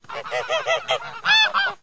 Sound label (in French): Oie